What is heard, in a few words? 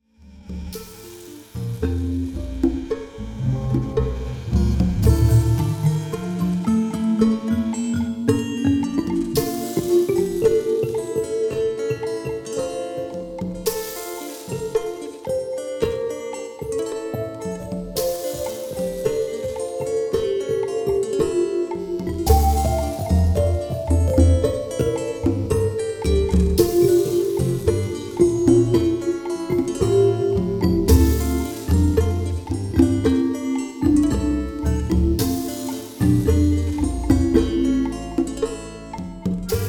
quarterinch